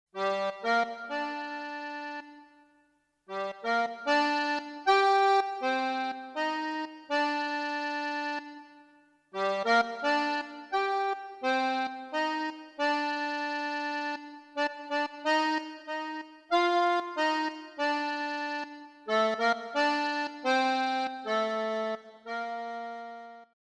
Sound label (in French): accordéon